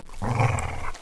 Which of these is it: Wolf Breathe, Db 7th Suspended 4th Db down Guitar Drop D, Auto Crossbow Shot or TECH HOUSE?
Wolf Breathe